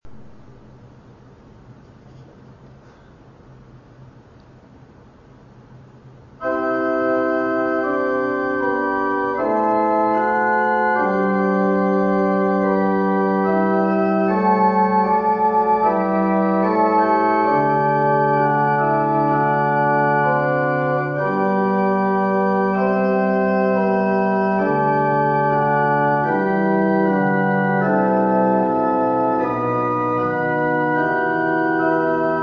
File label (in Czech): varhany; lobendava